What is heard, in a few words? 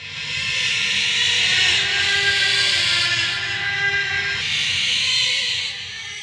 ghost; EnvSound